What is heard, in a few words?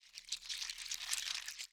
ambient